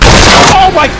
slam descend; abrams

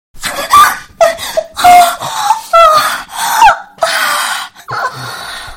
vault hit stinger

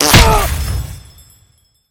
magician animal curse cast impact; kitty0706 sinclair sounds

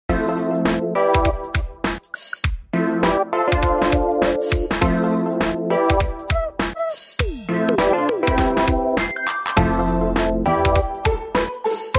curio music; Omori sound replacements

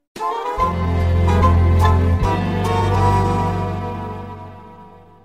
music stinger player respawn; Family Guy respawn

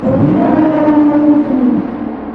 cat mine meow; prowler sfx

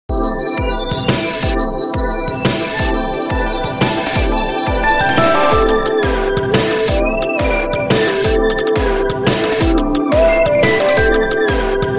music hideout search 155bpm; Omori sound replacements

